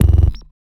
Glitch

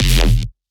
Bass Shot E Thicc, Dubstep; Ghosthack; Advent Calendar 2018

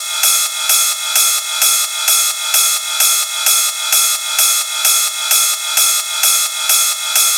Ride Loop 130, Techno; Techno Sounds 2024; Rides

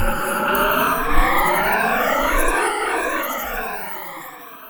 Overhanging Downlifter, Dubstep; One-Shots; Effects; Ghosthack; Dubstep Sounds 2025

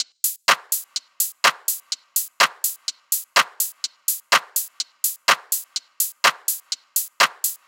Percussion 125Bpm, Techno; Advent Calendar - Day 6 - Techno Kit; Percussion Loops; Bare All

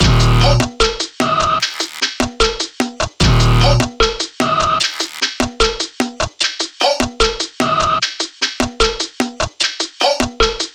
Weird Perc Loop - 150 BPM D#; Cymatics; King Drum Loops

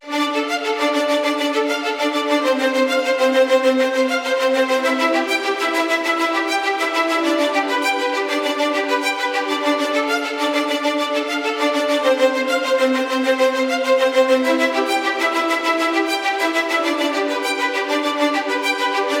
Viola Ostinato - Dmin - 100BPM; Construction Kits; STEMS; Ghosthack; SkyRealm; Kit 17 - Forgotten Glory

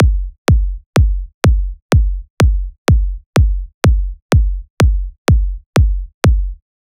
Slap House Kit 125bpm Kick; EDM Drums; Drum Kits; Stems; Ghosthack; Ultimate EDM Drums; Slap House Kit 14

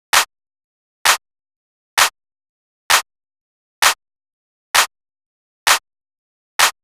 Clap Loop 130, Techno

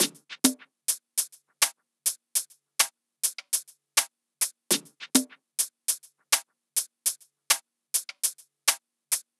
Percussion Loop - 102 BPM, Hip Hop; Amalfi

